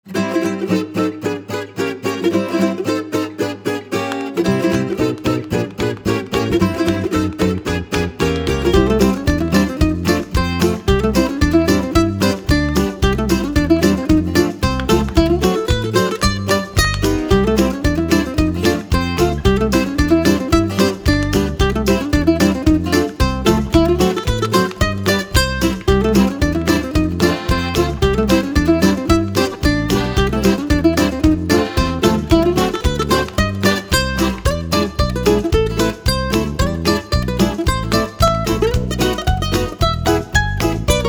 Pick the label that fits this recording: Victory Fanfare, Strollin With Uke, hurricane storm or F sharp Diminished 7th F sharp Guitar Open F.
Strollin With Uke